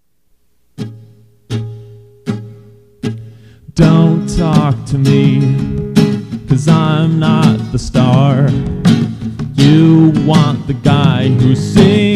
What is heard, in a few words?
bass players lament